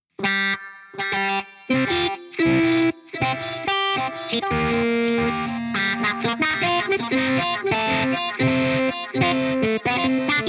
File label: delay distort; Miku 3